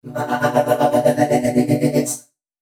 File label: male d, Dance Ultra Voice FX; Mutekki Media